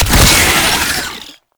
Latch Detach; Roathe Summon Gulphagor; Warframe; Tau

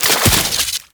Coolant Turret Fire; Warframe; Corpus; Arachnoid Coolant; Abilities

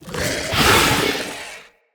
Attack Gap Closer, Vocals; Enemy; Characters; Corrupted Sproutfolk

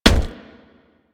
Crewman Footstep Run; Warframe; Corpus

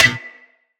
Melee Staff Impact Generic

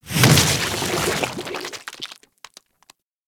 Fungus Explo Large; Warframe; Venus Open World; Venus Landscape

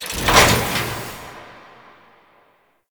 Foundry Build Mech Down; Interior; Ambience; Warframe; Nineteen Ninety Nine; Tailor Shop

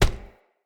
Unarmed Punch Impact Metal; Weapon Impacts; Player; Environment

